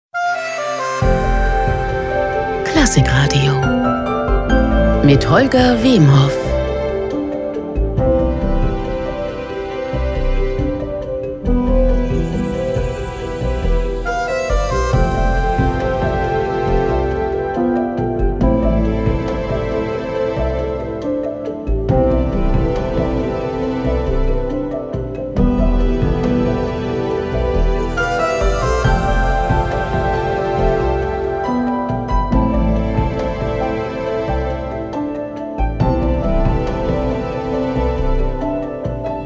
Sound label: Show Opener slow